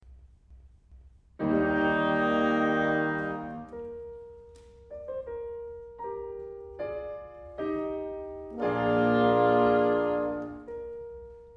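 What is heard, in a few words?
Piano Quintet Em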